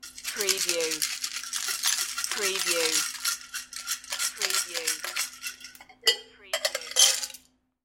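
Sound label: Ice Shaker Dump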